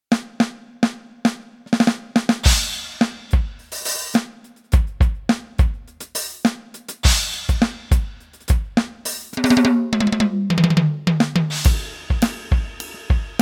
ALESIS Nitro Max Kit Funky

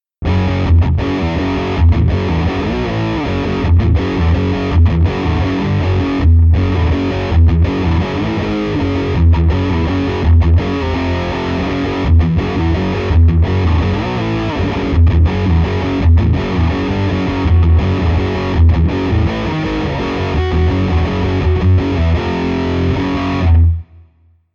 Heavy Bridge Pickup HB

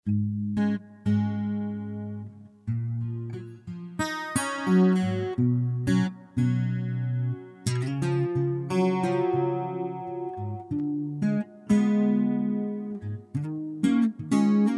BOSS VE8 GIT ENH CH HALL2

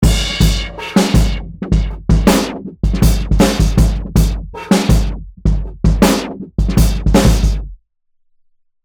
Filter Mod

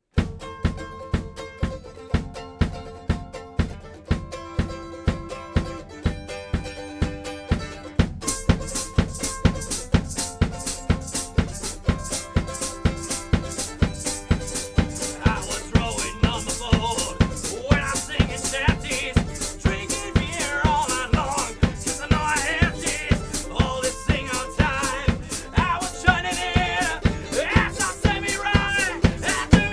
Oktava MK 012 01; Ein bisserl Spass am Oktava

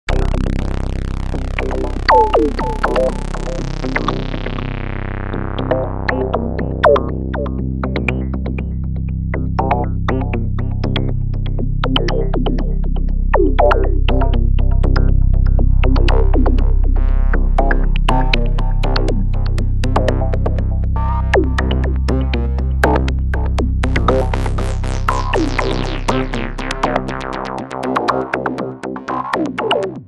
mix 3 sounds

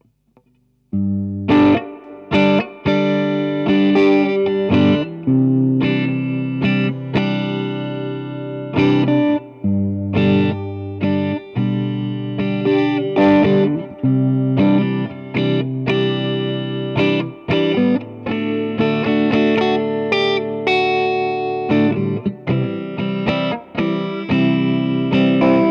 Warm Audio WAMPEX AC15 SM58

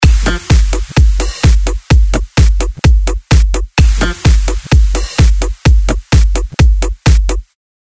electro

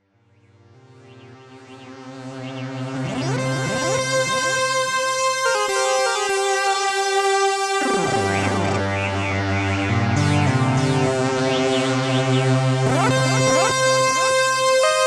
Solo Mono; Fizmo

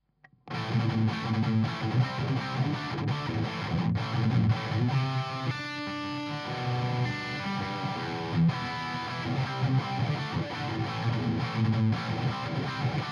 EHX 5150 Rhythm Burn PRS SM57

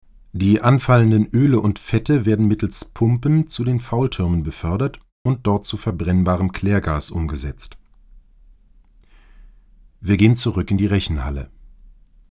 U87; Mini-Me

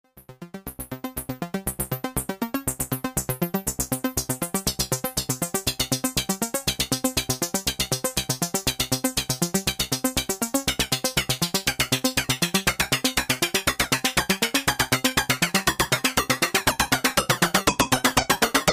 jup4 fx

